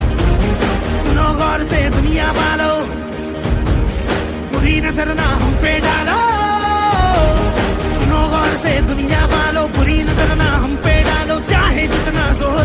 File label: Hindustani